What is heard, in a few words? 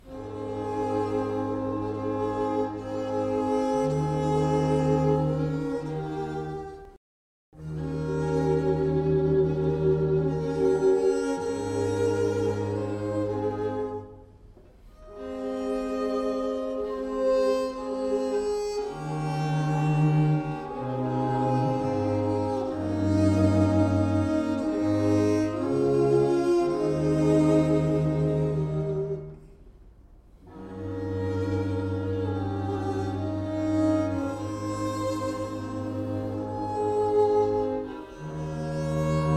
string quartet; wranitzky